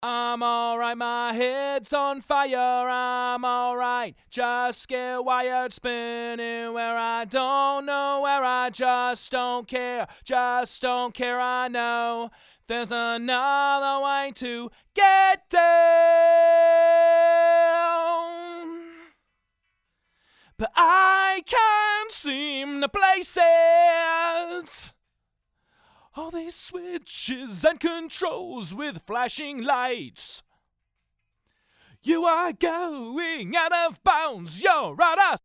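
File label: male vocal; Hybrid F.E.T. Opto Compressor; B172A 2A Male Vocal; Compressors, Black Lion Audio B172A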